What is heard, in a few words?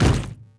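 metal hit hollow medium; objects; Star Wars Obi-Wan; XBOX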